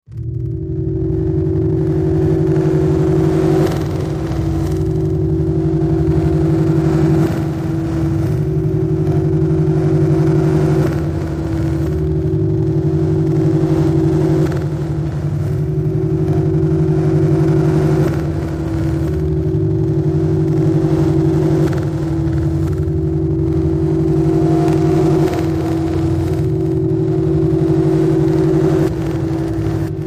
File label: Wash Drone Deep Electro, power core hum